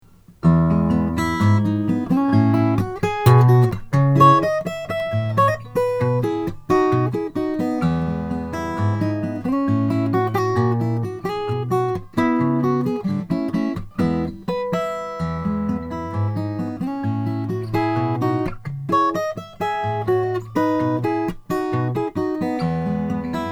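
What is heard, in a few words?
gerber rl15 sj nicaraguan rosewood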